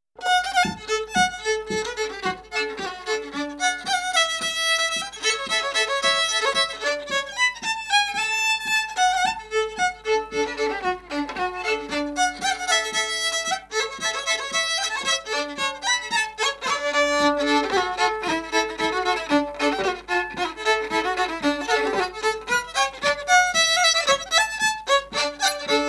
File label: Polka